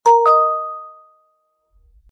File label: correct